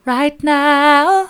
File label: right now soft, vocal